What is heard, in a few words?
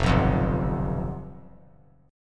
F Suspended 2nd Flat 5th, Fsus2b5 chord; strummed; 5,6,8,5; JGuitar